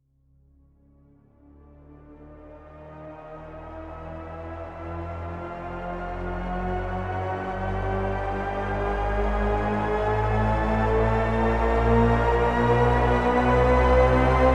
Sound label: Pad Shepard; Thorn